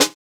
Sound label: Snare, JUNGLE BREAKS